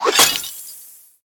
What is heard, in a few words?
Badge Shatter